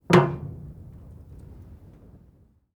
metal hatch

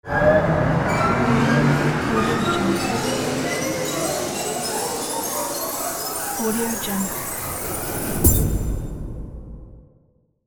creepy metal scraping horror swell

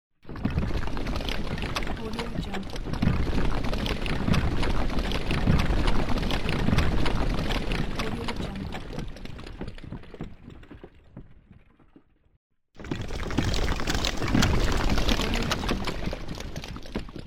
rockfall super pack

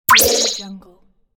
funny blooper

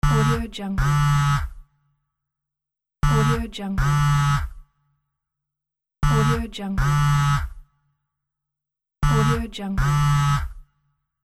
mobile cell phone vibrating

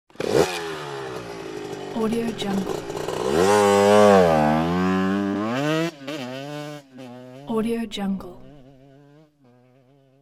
motorcycle cross sound; sounddream

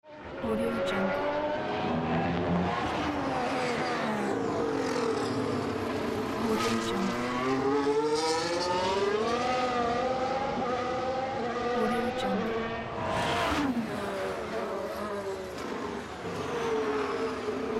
formula 1 motor testing

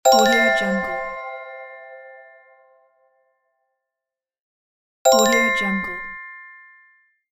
success

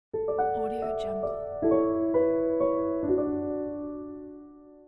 elegant classical logo

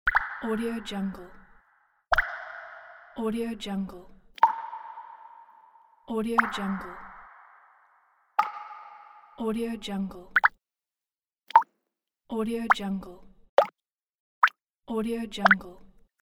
water drop

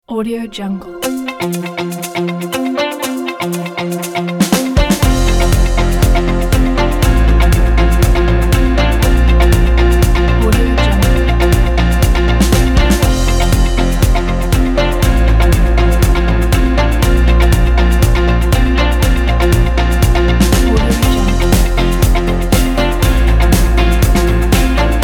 indie rock corporate

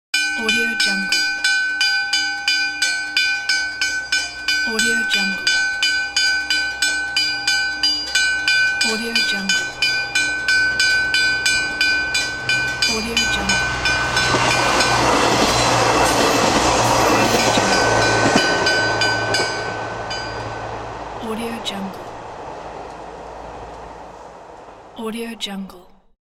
bell warning train station

